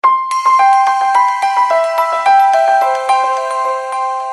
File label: Waterfall Ringtone